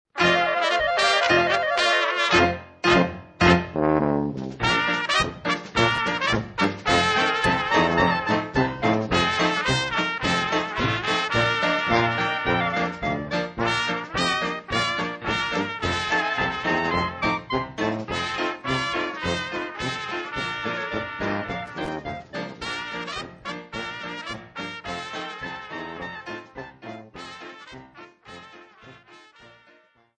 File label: polka